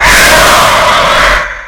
screamer; funland; leopold

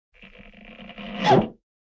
heavy cork squeak and pop

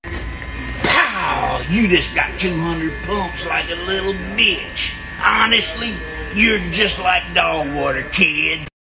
pump

